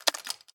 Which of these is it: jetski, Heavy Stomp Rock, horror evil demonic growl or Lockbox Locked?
Lockbox Locked